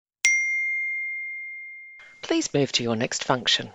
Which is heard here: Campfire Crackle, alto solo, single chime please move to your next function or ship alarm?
single chime please move to your next function